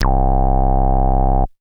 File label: BASS; Butter Bass